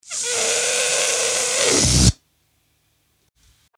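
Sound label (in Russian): шарик сдувается сильно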